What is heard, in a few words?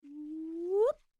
whooop